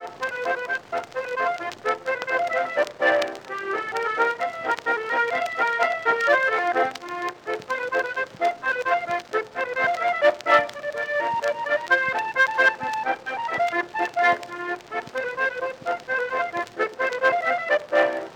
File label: Polka Warszawska wiszniaczka Warsaw polka; loc-edison